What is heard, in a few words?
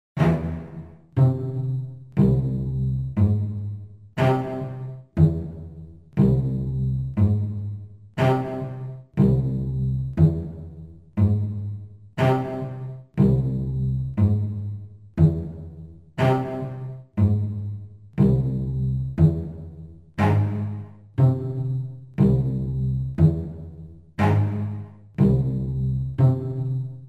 pizzicato canon